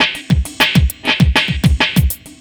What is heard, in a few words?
Beat, Futurist Drum & Bass; Drums & Percussion